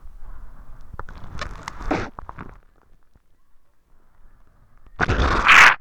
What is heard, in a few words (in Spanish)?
toma mate; Oído Absoluto